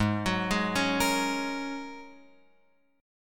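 A flat 7th Suspended 2nd Suspended 4th A flat Guitar Open F, chord; Ab7sus2sus4 arpeggiated; x,3,6,3,4,5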